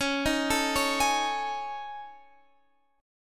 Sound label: A Major 7th Flat 5th